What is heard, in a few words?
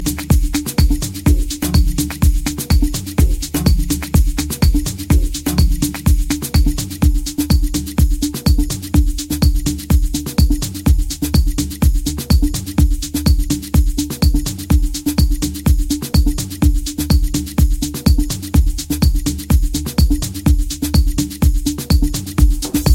Deep Afro Inst